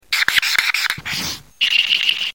Marqueur

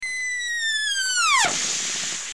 Ballon

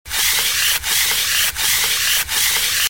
Ponceuse

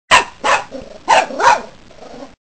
Chien

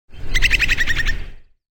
Oiseau

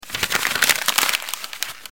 Papier

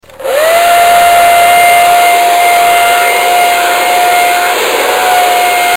Seche cheveux

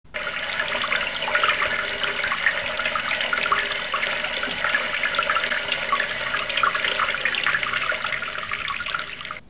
Evier

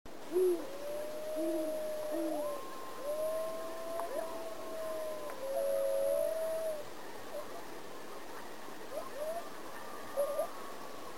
Gru oie

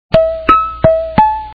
Sonnette

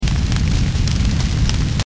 Feu

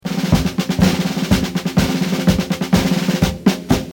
Tambour

